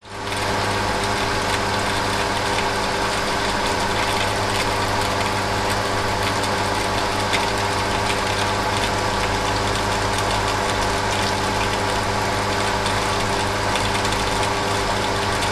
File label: industrial pump swimming pool filter operate